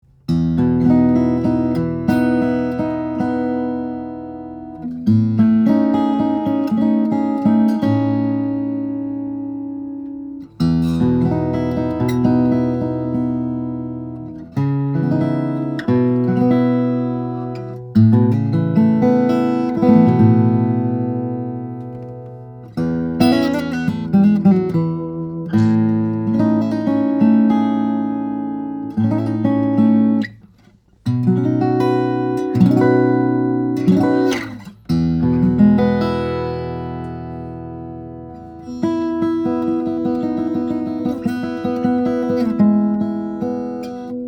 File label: guitars; Bourgeois Soloist OMC; african blackwood; german spruce